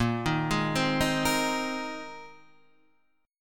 A sharp 7th Suspended 2nd Suspended 4th, down, Guitar, Open D